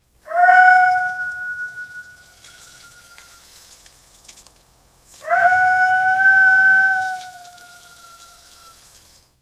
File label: a soft comical fall; scarecrow